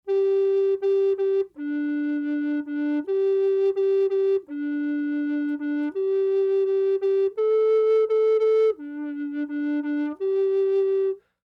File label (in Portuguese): FLAUTA DOCE TENOR